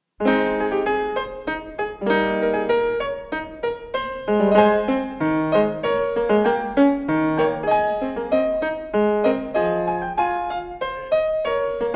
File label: Prelude in A-Flat Major, Clavier; Prelude & Fugue No. 17; BWV 862; Bach; The Well-Tempered Clavier I